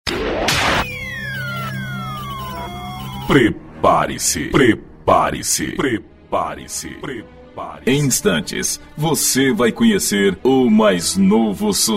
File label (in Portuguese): balanço do forró abertura